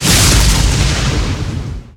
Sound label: lightning spear cast